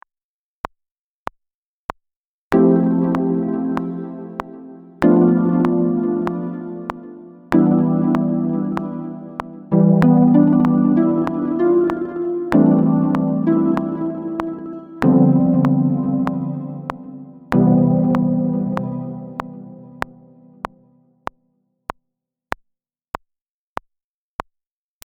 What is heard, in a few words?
Pluck; Luminis